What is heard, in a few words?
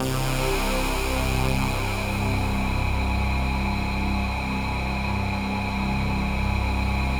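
pulse C; Masterbits; Vintage Voltage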